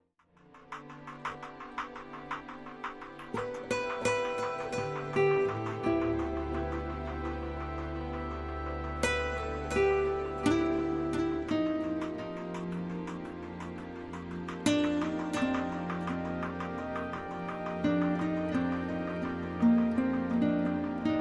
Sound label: Guitar Section